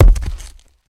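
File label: Rock Dirt Heavy Dead Thud; rocks & dirt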